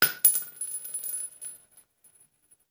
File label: Bullet Shell; Sound Ideas; Guns